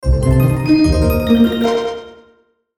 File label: Musical Delay, Musical Delay Twinkle Orchestral; Musical Sound Design; Blastwave